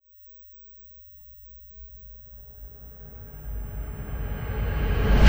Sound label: Kick Shard, Processed Drumkits